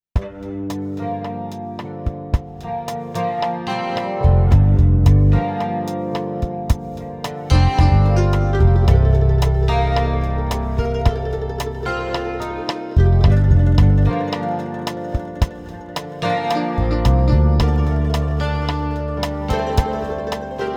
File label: country; Multi-patch demo 5; xp-80; roland demo cd